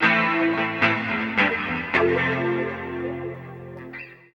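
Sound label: guitar, right; East West - Phat & Phunky CD2; 90's Sample CDs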